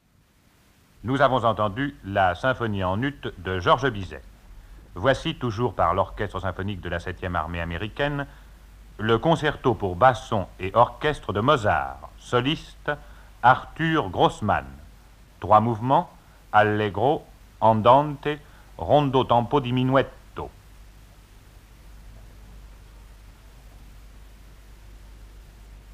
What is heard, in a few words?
Mozart Bassoon Concerto